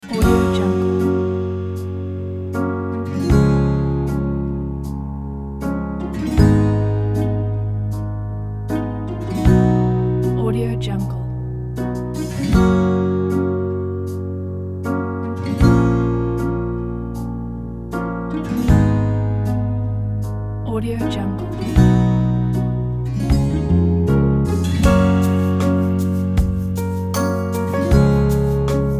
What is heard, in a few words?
soft inspiration